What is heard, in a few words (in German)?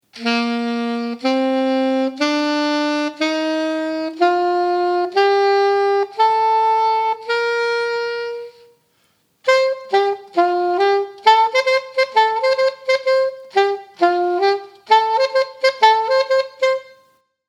Saxofon